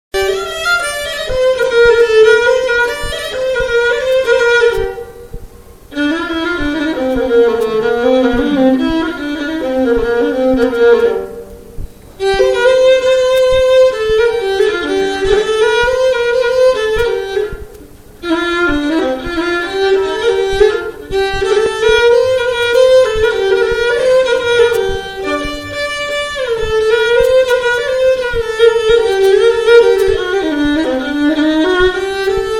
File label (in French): violon